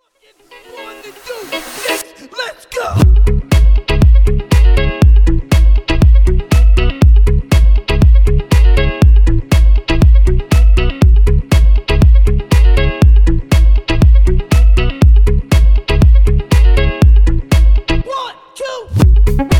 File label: Lounge